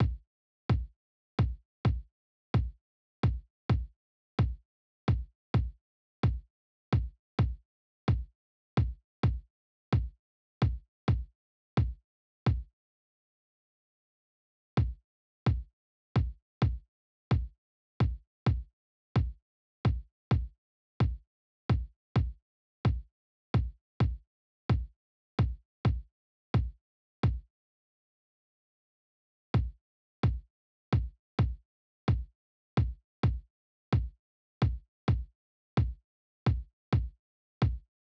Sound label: Kick